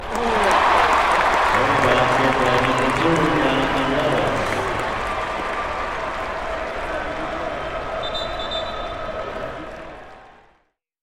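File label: Arena Crowd Applause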